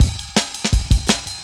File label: jazz ride cut, drum loop, 165.5; Jungle Warfare 1&2